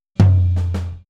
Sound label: Boogaloo Fill Tom Floor, 82 BPM; Sonic Foundry; Drums from the Big Room The Mixes